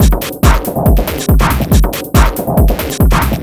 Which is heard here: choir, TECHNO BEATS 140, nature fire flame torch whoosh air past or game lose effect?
TECHNO BEATS 140